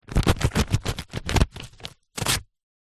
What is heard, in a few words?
paper sheet cut with serrated knife; foley